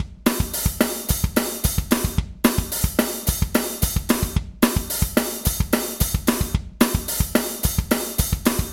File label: Metal Drums